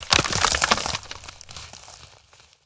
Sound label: Treant Medium Attack Underlay